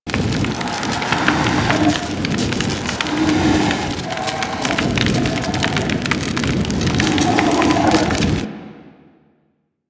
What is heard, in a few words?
Fire Trail Loop; Warframe; Warframes; Nezha